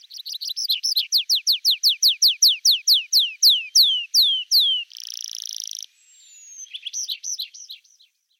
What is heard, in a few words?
tree pipit song